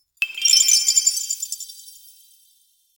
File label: soft crystals drop on